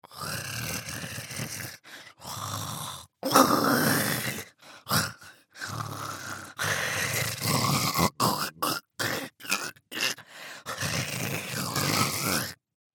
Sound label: Fishman Creature